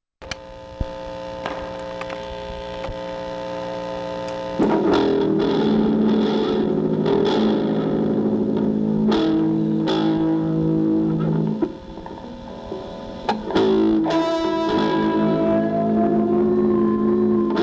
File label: Lame Noise; Burrito